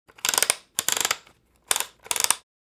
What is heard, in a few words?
Gear turning ticking